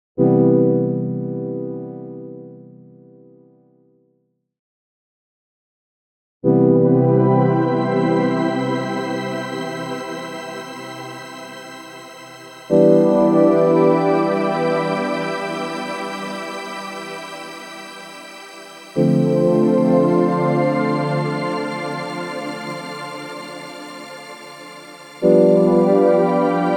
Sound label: Poly Synth; Luminance